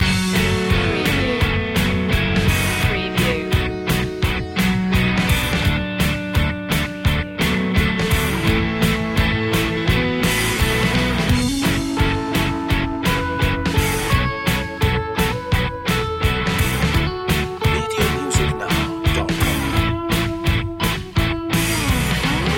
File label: invigorating loop